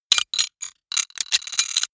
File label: robot rustle click